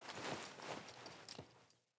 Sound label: Foley Actions Default Wolf Jog Stop